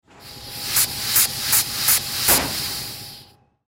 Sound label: Hydraulic Pump Machine Short; Machinery; Industry; Blastwave